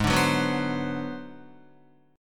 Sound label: Ab 7th Sharp 9th Flat 5th Guitar, chord; Ab7#9b5 strummed; JGuitar